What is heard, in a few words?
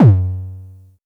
High Kicks F#2; LIMAT Div Dept; Drums